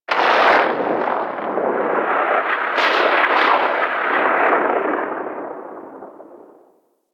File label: Ext Distant Air Rip, Gas City, Ambience; Warframe; Corpus Gas City